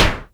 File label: SYNCUSSION